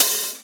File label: Open Hats, Cymbals